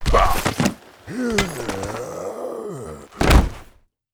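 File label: ARCANE scholar death, monster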